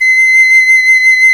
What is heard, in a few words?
PICL; Roland - LA Composer vol. 1; WOODWINDS